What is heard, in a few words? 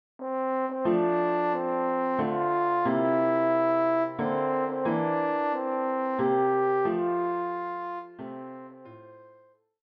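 horn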